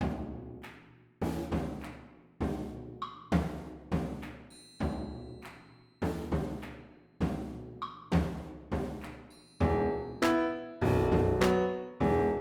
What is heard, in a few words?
Double Piano Edition